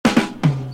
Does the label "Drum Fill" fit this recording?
Yes